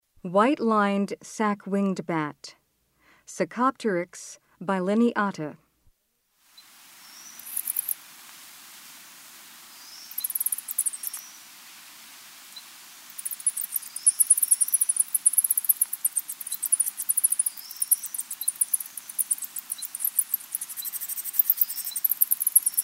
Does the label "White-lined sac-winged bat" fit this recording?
Yes